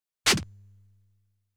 Boss Moves

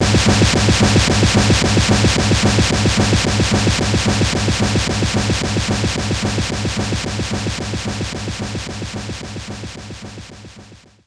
Casino Fill; Sly Cooper and the Thievius Raccoonus 2010 PS3

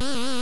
Sfx Hurt; Pizza Tower early build test ost

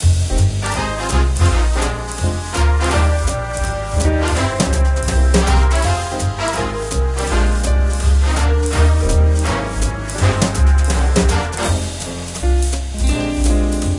Big Band Music

